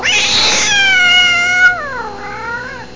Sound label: Happy Cat